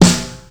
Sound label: snappy snare drum sound f key; Mono; snare-drum-samples